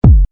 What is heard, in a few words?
kick